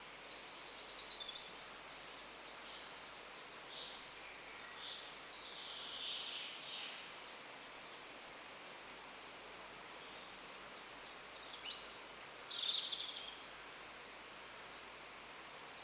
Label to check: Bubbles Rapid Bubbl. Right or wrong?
Wrong